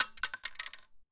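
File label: bullet case bounce 308 glass; gmod; viper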